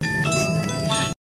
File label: Ding